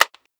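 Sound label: bolt lock, mp5k; sven; cof; guns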